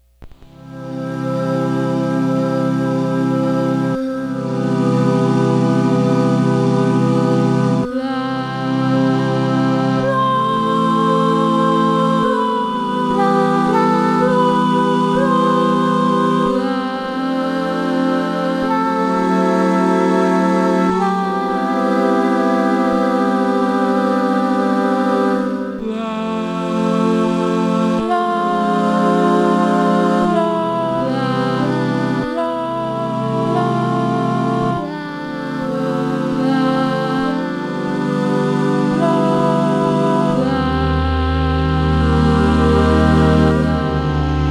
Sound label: BREATHY OO SOLO LA